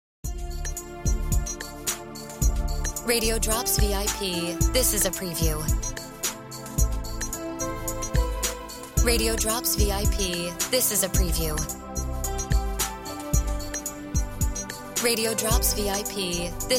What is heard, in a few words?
No FX Music Bed